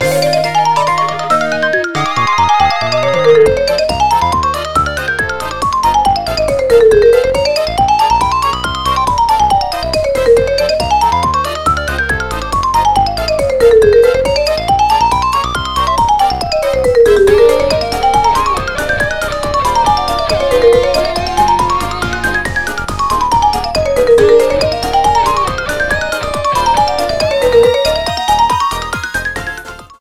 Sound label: Music Park Xylophone